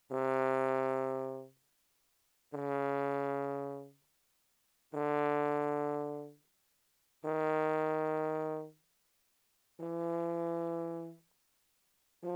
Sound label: Tuba ff C3 C4